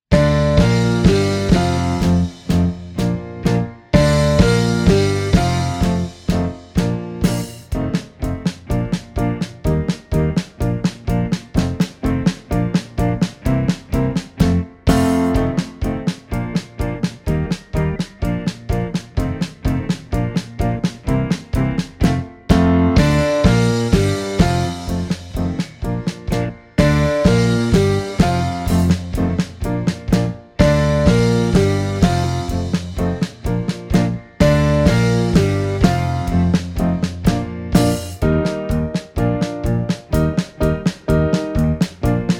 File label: Flute Backing Track